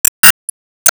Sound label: Enemy Ufo block Common Delete